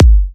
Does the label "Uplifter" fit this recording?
No